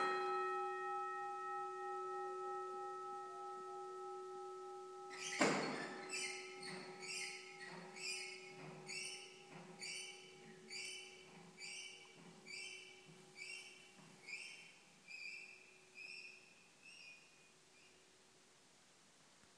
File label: sound of a ringing bell and the squeaky fold down handle; from the mid seventeenth century